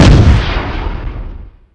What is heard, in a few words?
weapons rocket